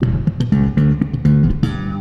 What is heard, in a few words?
Bass wet